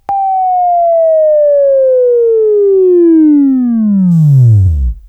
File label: Buzz; Labview-Program; Sweeping Training